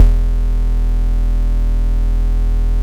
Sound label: cronic bass, Analog & Synth techno; USB Soundscan vol.12